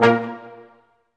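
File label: BRS, Brass Staccatos, Brass Ensemble; Roland L-CD702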